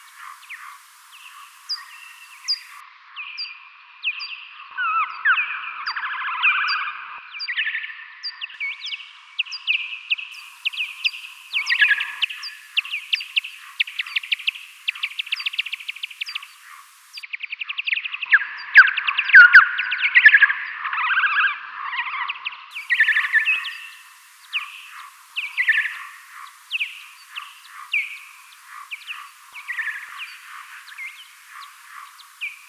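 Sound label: Nyctalus noctula, Fledermaus; Boehm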